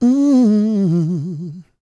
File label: A MMM, Vocal Blues Soul; Spectrasonics; Planet